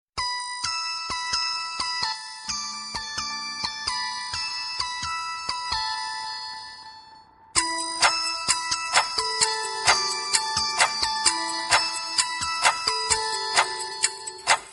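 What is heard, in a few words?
Piano message tone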